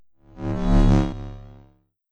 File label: Enchant Frozen Blight Elec